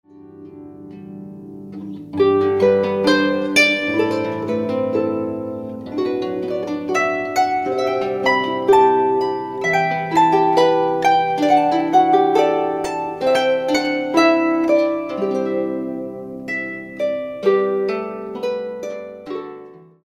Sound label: Autumn Harp